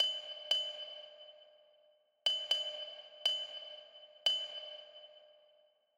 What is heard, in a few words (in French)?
canon rythmique rythme seul